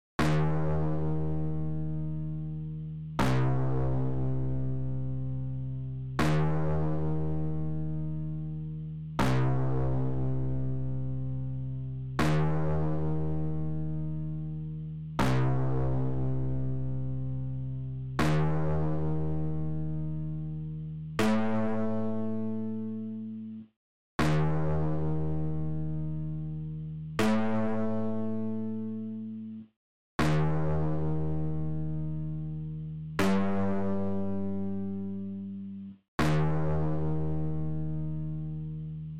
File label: soundtrack bass; Cleanomancer